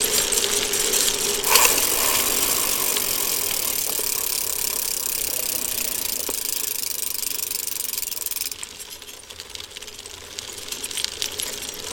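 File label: Pedal Coast Stop, bicycles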